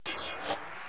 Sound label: SCAN DONE